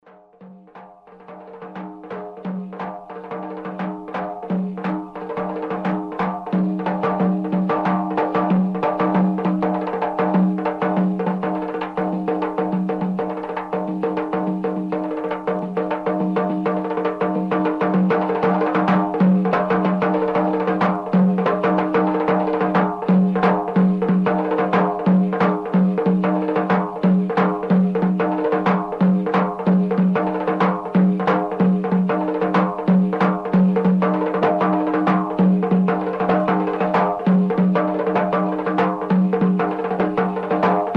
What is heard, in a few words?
DOIRA